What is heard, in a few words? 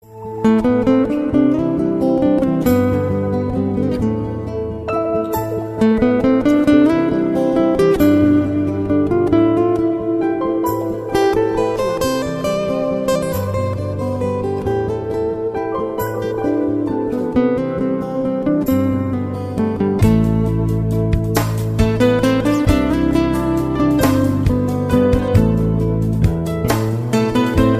World Guitar